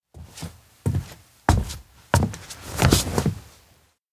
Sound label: stage up; anemoi